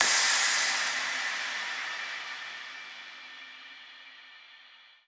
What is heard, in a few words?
soft hitfinish